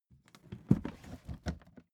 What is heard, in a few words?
suitcase swing case open